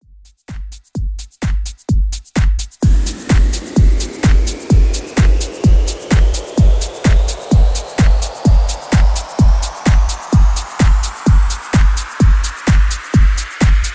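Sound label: Club Extended Mix 128 Bpm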